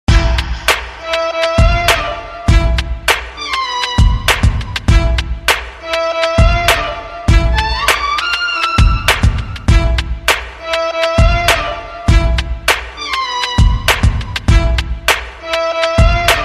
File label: techno rock rnb mix